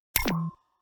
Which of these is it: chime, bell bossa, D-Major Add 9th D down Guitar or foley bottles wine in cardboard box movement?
chime